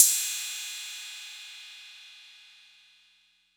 Cymbal 808 Tone1; gate experiments

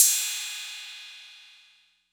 Cymbal 808; Tone8; gate experiments

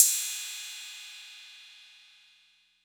Cymbal 808 Tone11; gate experiments

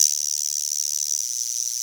Guiro C78 Long; gate experiments

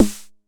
Snare 909; gate experiments

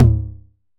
Tom 909 Mid; gate experiments

